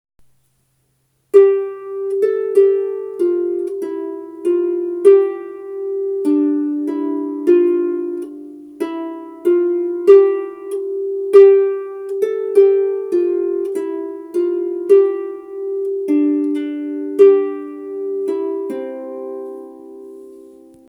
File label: London Bridge is Falling Down, harp